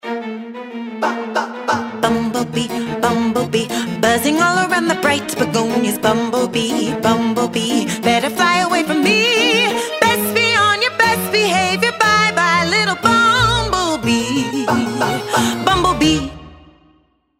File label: B Song; Bumblebee